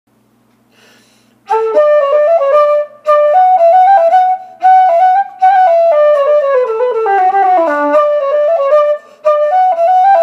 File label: polska; bleckingar